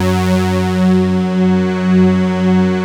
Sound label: JUNO 106, PADS; Masterbits; Vintage Voltage